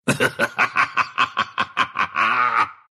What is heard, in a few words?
laugh, ru; Lycan